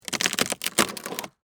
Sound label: Impact Wood Crack, Splinter; Materials; Blast Wave